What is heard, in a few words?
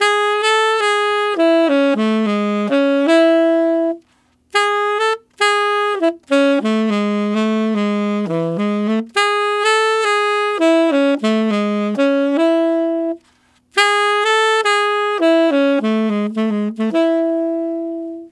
Tenor Latin in A maj, Tenor Sax Loops; Sonic Foundry (Sony Creative Software); Jazz Solos and Sections